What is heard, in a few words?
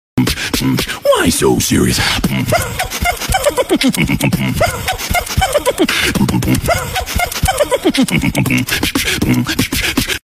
beatbox; why so serious